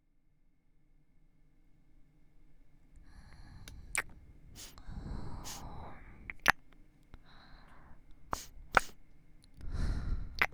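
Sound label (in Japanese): 耳舐め 両耳（キス、耳嗅ぎ、耳舐め、耳まぜ）, NoTalking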